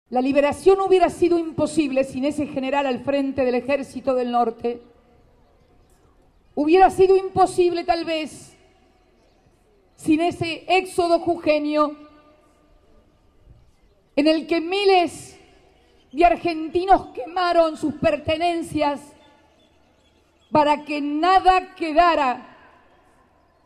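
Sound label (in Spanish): acto dia nacional de la bandera; cfk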